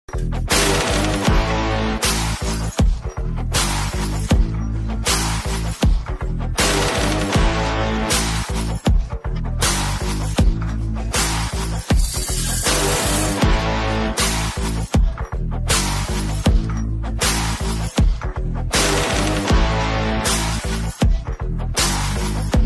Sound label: star vintage love song cover bgm ringtone; kavin